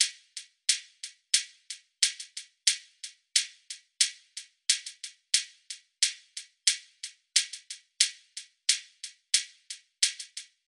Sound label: Drum Loop - 90 BPM Hihat, Boom Bap; Loop Stems; Cymatics; GHOST (Beta Pack); Armed Drum Loop